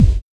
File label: NOISE BD, bass drum; BASSDRUMS